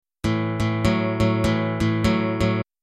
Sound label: a5 to a6 shuffle; 12 Bar Blues